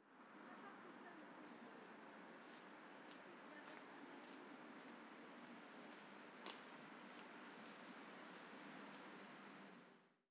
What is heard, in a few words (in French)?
Vent Pas Gravier Discussion Au Loin; Petite Ceinture